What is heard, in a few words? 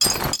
build; ships; ratika